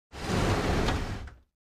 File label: Large Chest Slide; crashes & creaks